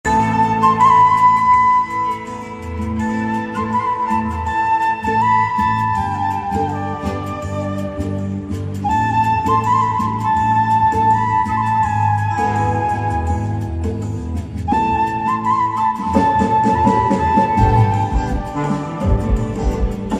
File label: flute ringtone bgm music ringtone; south indian actress